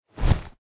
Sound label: Unstable Bat Wings Flap; TANK; 3Souls